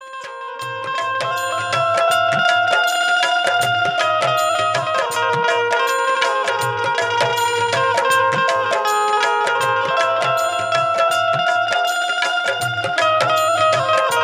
ringtone instrumental

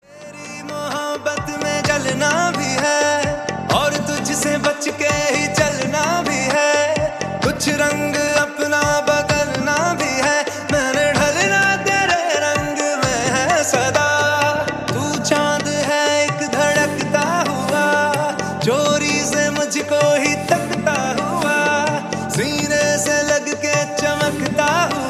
ringtone instrumental

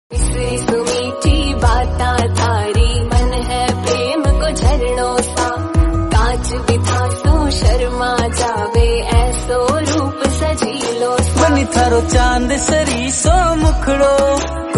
Banni Rajasthani song ringtone

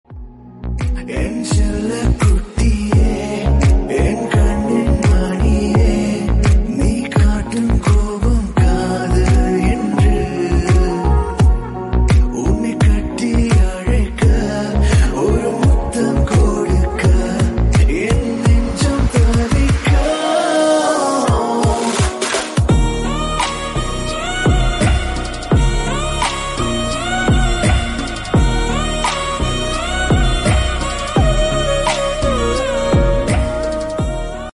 ringtone instrumental

